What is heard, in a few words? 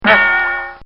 Cartoon Sound Effects Bong; Cartoon Sound Design